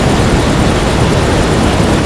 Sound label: osprey loop; Soldier Of Fortune II Double Helix; XBOX; ambience